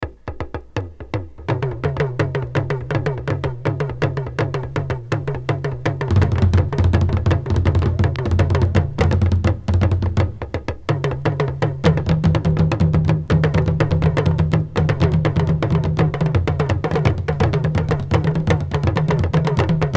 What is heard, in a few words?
Talking Drums; KPM 1000 series